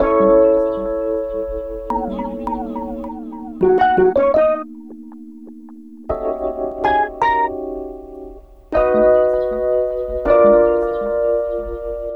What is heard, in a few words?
Melody, Hip-Hop